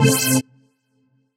RAVE, RAVESYN

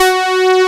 OBX SAWS F#4; E-MU Formula 4000 Series Vol. 5; Protozoa

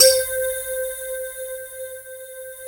ooh pole C5, pan pole; Synth

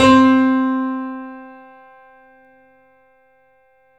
C, Piano; InVision Interactive; The Piano; BOES PLAY HARD

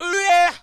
perc voice, Roland MC-909

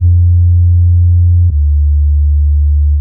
bass, Fmaj, 159; Big Fish Audio; Hardcore Breakbeat Action

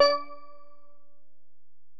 Bell, Alesis DM-PRO

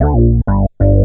SYNT, Roots Funk; USB Soundscan vol.41; AKAI

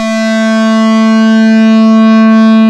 SPACE DRON, JP6 Lead; E-MU Producer Series Vol. 2 – More Studio Essentials

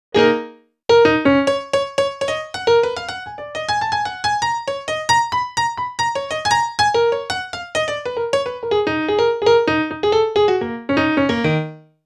Piano Solo, Cinematic Funk; Foundry (Sony Creative Software); Cinematic Funk Urban Tone Poems; Solos

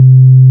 CLUB BS C4, CLUB BASS

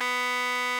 RMI; Sonic Reality - Vintage Timetraveler

